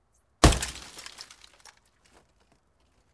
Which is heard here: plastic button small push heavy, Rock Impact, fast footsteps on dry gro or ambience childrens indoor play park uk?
Rock Impact